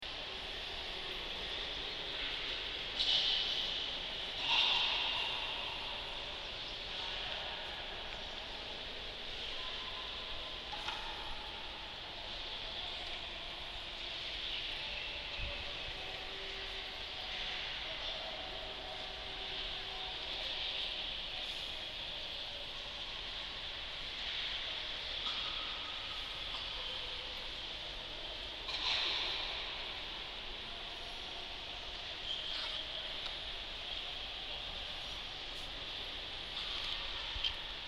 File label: Delhi Lotus Temple